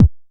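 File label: 909 kick short, EMU Proteus-2000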